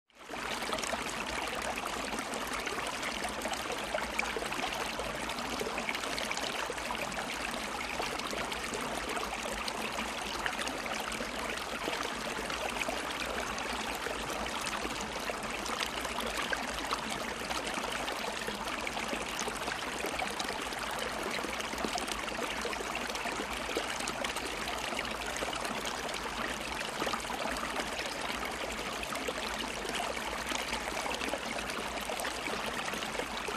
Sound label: Creek Stream Closeup